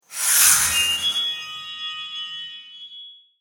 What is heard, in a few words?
menu open